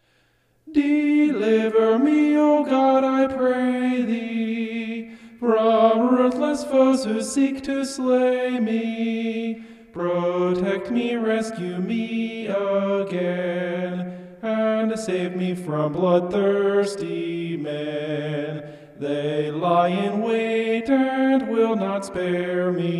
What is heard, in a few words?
vocal homoph; psalm 59